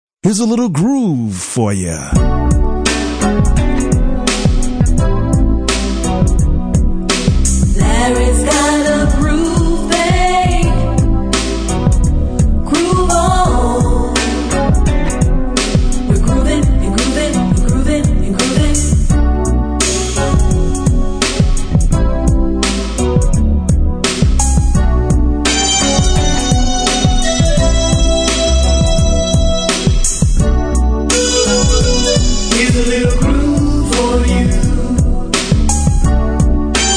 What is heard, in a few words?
r&r groove fade